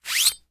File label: Sword Remove From Nylon Holster, weapons; AdobeCC